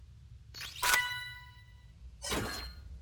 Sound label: key get; HLD